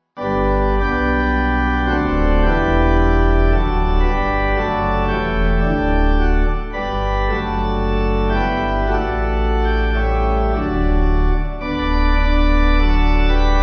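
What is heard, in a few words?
Pipe Organ, Music Backing Files for Churches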